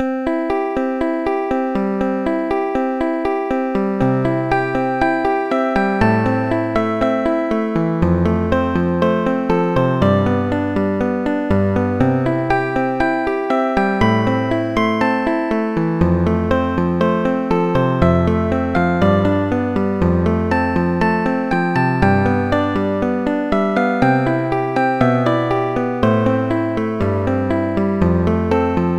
folk song, MIDI; The Music Studio

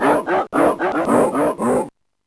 dog song; Sonix Music Driver

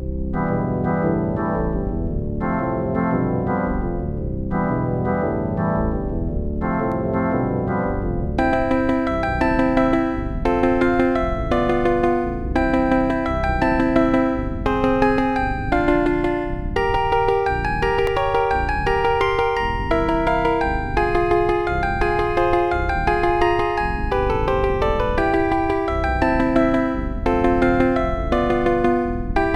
ballad song, MIDI; The Music Studio

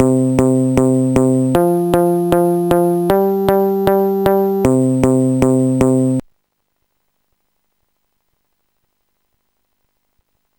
bass; Sonix Music Driver

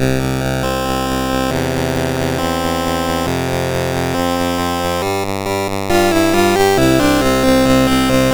Tank Simulation MOS6581R4; sid

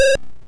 sid MOS6581R2

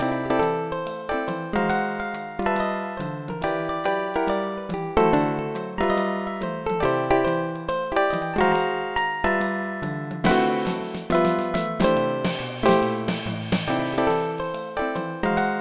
solo; Dr. T's MIDI Recording Studio

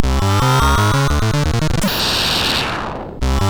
MOS6581; Demogame Example

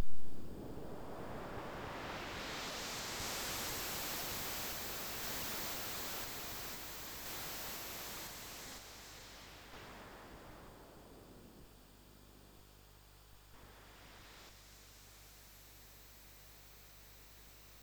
intro, sid MOS6581R2; Underground 07

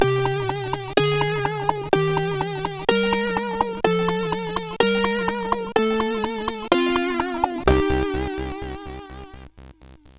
sid CSG8580R5; Alien 8

